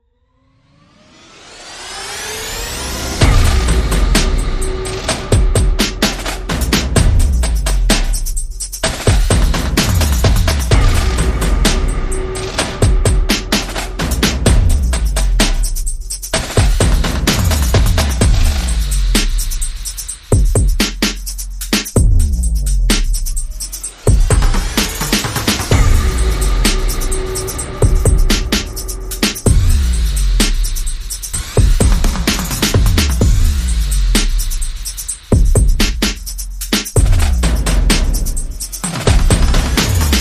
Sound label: No Brass